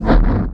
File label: MENU WIN; maddenfull